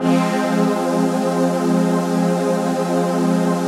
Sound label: CHRD PAD; Best Service; XX Large Pads; Sample CDs